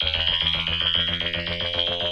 113 computer desire, SYNTH AND ELECTRONIC LOOPS

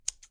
Scissors Shears; UNUSUAL KITS and FX

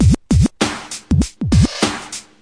beat, Scratching and Turntablism; white homeboy beat; drum loops and breaks

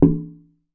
African Wooden Drum Low; ETHNIC and WORLD PERCUSSION

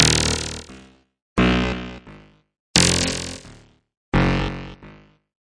quirky sparse hard synth melody; SYNTH AND ELECTRONIC LOOPS

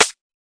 tight hit, Tight n Hard Kit; Hip Hop; style kits

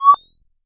8bit kit question, 8 Bit Videogame Kit; LO FI

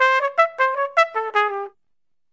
acid jazz trumpet

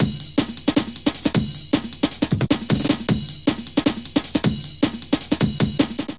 155 suburban, drum loop; 141 to 160 bpm

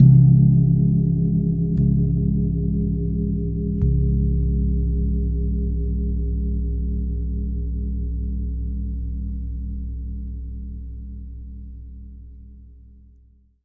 Long Gong Multi Hit; ETHNIC and WORLD PERCUSSION; Longevity Gong by Amani

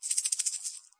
rattle, ethnic and world percussion; Rattles

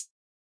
Hat; STYLE KITS (Hip Hop, Etc)

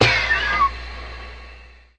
factory release, Factory Percussion; UNUSUAL KITS and FX

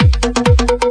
energy percussion, drum loops and breaks

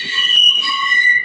subway sound; Subway Sound Construction Kit

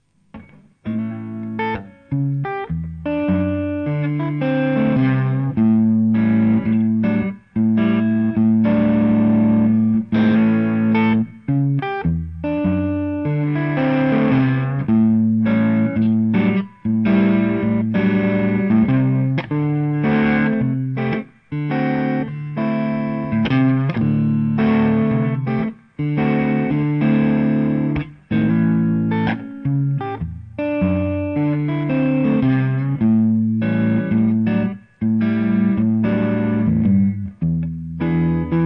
fuzzy blues

